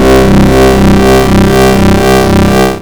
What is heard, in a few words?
Dance Mega Synth; Best Service; 90 s Sample CDs